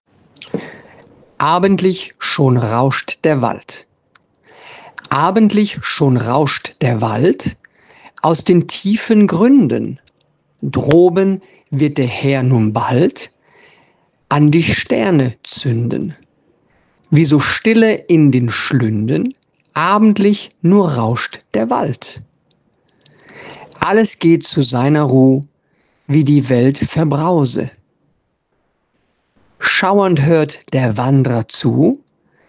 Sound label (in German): Abendlich schon rauscht der Wald